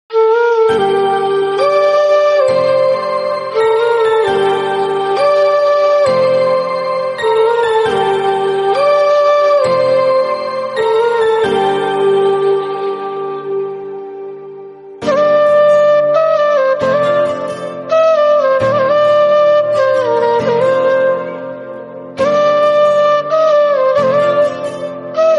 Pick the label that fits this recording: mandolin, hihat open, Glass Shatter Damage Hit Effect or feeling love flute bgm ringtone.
feeling love flute bgm ringtone